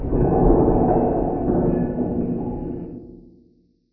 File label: freight fall distant